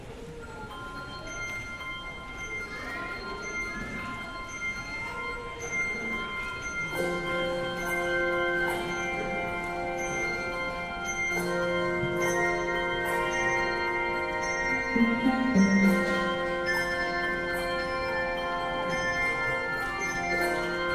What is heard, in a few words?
Handbells Fanfare; Hymn to Joy